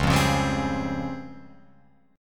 C sharp Major Sharp 11th, Guitar Open F; C#M#11 strummed; 1,0,1,2,3,3; JGuitar

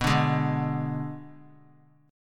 B Minor 6th B, Guitar; Bm6 strummed; x,2,2,1,2,x; JGuitar

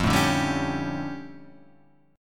D Minor Major 11th, F

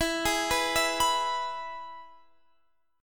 E Major guitar chord, Standard; arpeggiated; JGuitar

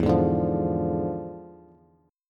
E 7th Flat 9th Bass, chord; E7b9; strummed

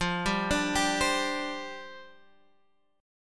C Suspended 2nd Suspended 4th, F

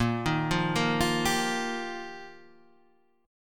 F 7th Suspended 2nd Suspended 4th

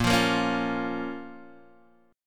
A sharp Minor, down, Guitar, DADGAD

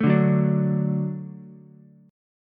F sharp Minor Sharp 5th, Ukulele; strummed

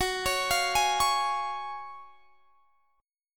F sharp Major 7th Suspended 2nd, F sharp, down; F#M7sus2 Chord; arpeggiated

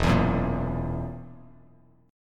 E Suspended 2nd Flat 5th, Bass Standard, chord; strummed; JGuitar

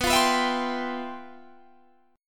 G 13th B, chord

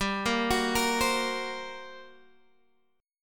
G Minor Major 11th, G, Guitar, Half Step Down

